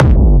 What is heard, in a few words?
Techno Kick; 30 Techno Kicks 2025; Ghosthack